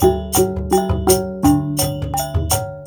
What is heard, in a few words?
WYANG L, Heart of Asia